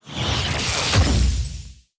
card combine; Animation Throwdown; cardbabies

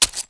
Knife Stab

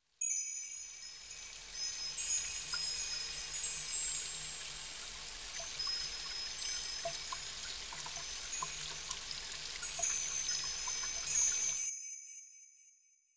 Rain cute Loop; Yu-Gi-Oh! Master Duel

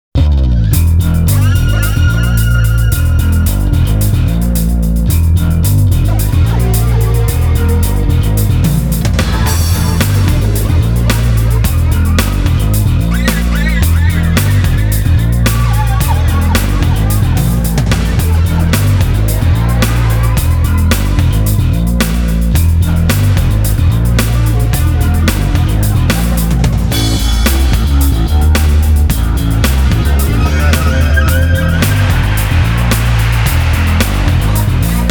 moaning electric bass

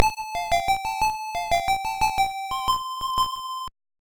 Lullaby; Esper Dream 2; nes; Lisa's Lullaby

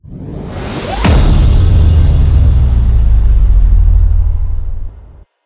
Transition

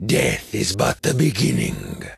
Taunt; Hero Mortemis

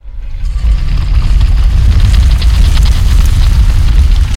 Door Stone big

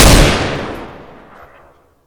Weapons Sg556

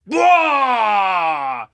burstout

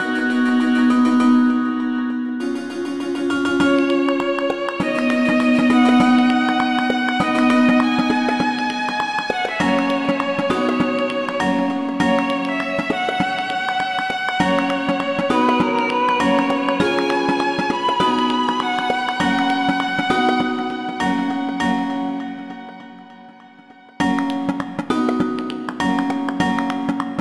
Chiming Day; Negligee

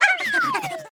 sfx payback; Animation Throwdown

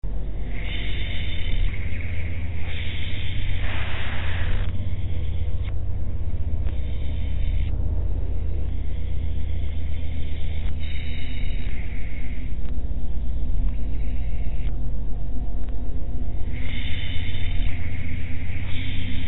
atmospheric; quake 4

